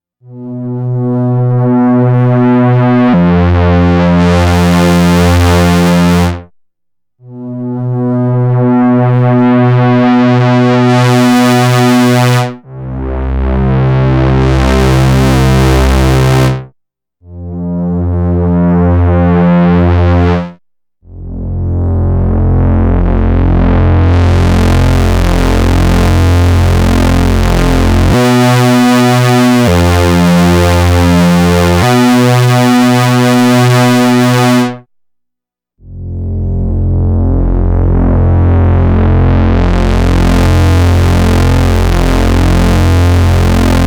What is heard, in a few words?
EML101 slow Attack